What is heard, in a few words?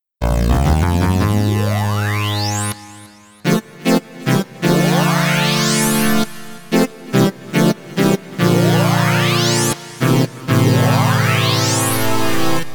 seq rise up; the transmitter soundset